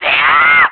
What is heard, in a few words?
Spin Attack; spin-attack shouts; Link's spin-attack shouts; zelda